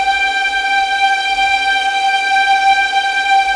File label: tremolo; Level4 Hidden World